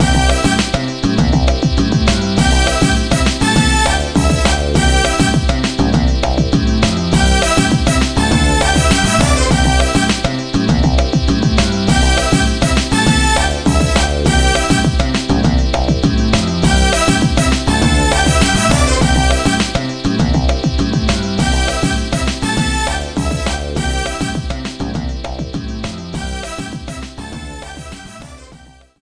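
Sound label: background music happy; My Frogger Toy Trials; Nintendo DS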